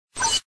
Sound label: click; Memory games; Greatest common factor